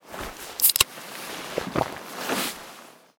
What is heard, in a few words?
drink soda use; Stalker Anomaly; interface item usage